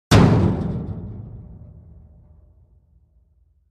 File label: Industrial Waste Removal Bin Hit with Sledge Hammer